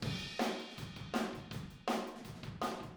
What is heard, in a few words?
Room ST, Drums, 162 BPM; Drums from the Big Room The Mixes; Take Your Toms Off; Chorus 02